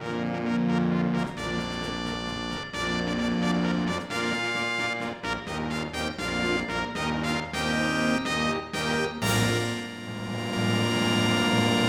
Win; thoroughbred breeder ii plus; ps1

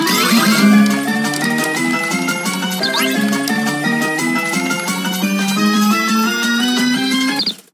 fadeout event; WrestleQuest

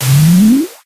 Board Move Back Sound; Jolly Battle; Android

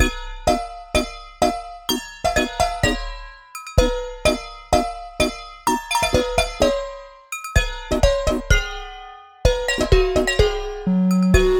Tavern; SanctuaryRPG

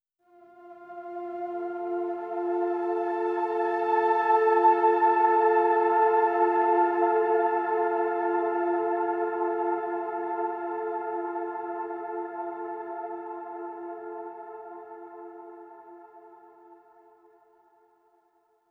Overlay Drone; Mirrors Edge Catalyst

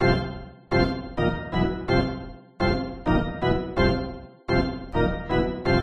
Ditty

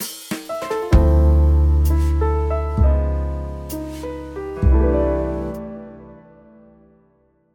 catch; meteor world actor

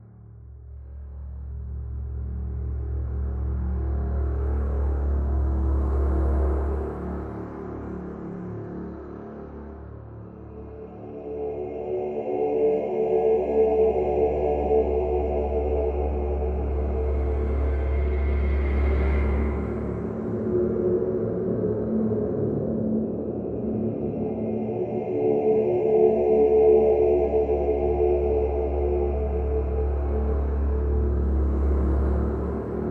Demonic Ambience LOOP; unrest indigo

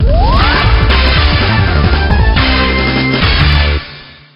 Touchdown!; nfl blitz 2001 dreamcast n64 ps1 gamerip 2000

